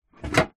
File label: plastic file tray drawer open; office